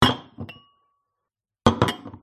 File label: pub wine glasses place on bar; field reports